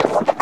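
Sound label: jump roll; Extreme Sprint 3010; PS2; pryzhok kuvyrok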